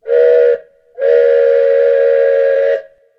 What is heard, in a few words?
train whistle